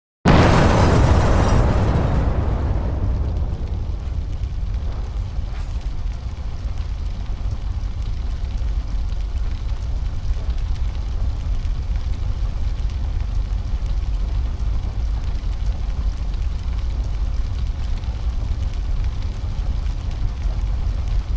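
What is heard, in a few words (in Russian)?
машина загорелась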